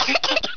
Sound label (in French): tousse